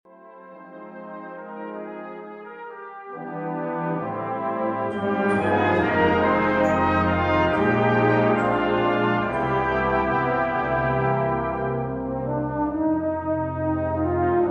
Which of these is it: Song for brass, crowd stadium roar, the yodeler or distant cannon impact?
Song for brass